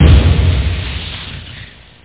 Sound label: crash